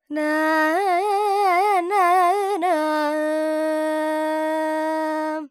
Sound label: Lydian, Vocals, Carnatic Female, Alaps, Dry; Sample Banks